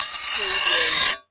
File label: Knife Scrape